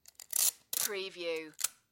tape roll pull and tear

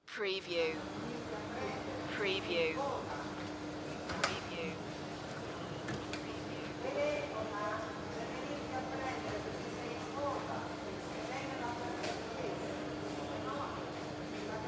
Italy Train Platform; sound-effects-bible

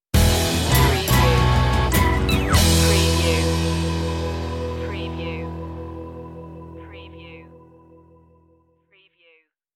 delta sound stinger

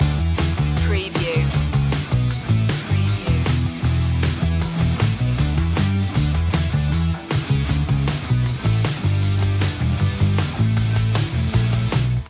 high energy loop

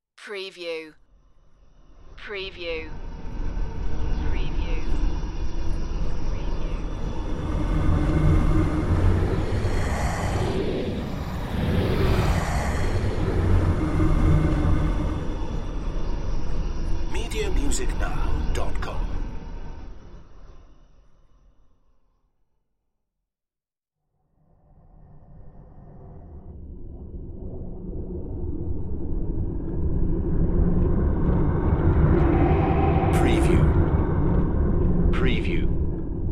dark space suction

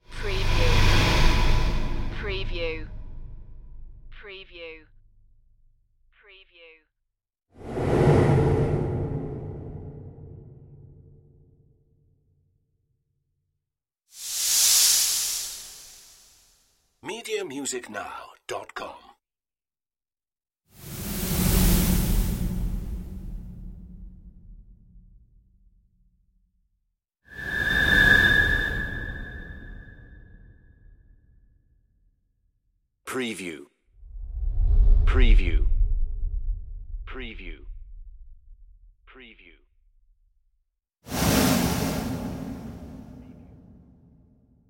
space whooshes

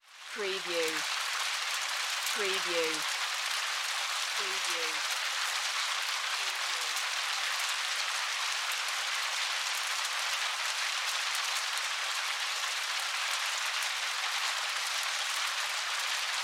Nature Weather Rain

